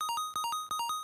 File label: Pause Jingle